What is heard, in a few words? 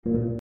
bounce; spacetracer